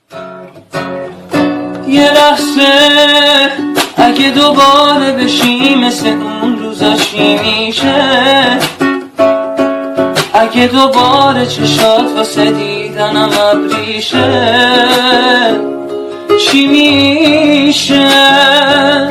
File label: Guitar; با گیتار